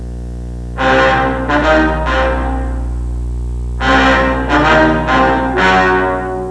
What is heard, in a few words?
Fanfare